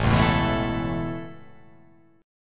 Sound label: A Major Add 9th A, chord